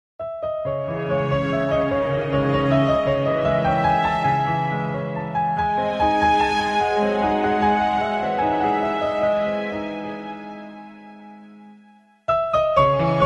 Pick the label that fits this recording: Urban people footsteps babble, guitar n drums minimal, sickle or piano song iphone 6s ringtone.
piano song iphone 6s ringtone